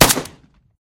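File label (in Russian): меткий выстрел на соревнованиях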